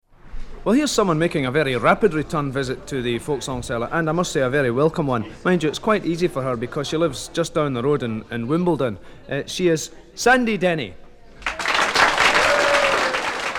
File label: folk song; folk song cellar; bbc home service 1966